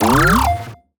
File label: Retro FX; Cymatics; Video Game Sample Pack Vol 1